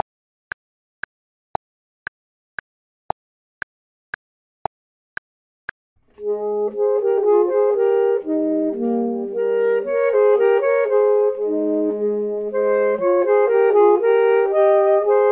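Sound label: both, Saxophone and Clarinet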